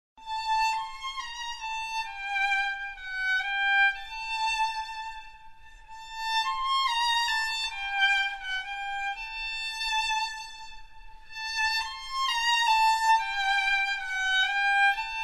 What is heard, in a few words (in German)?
String Quartett